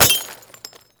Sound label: Glass Bullet Impact; Warframe; Ambience; NineteenNinetyNine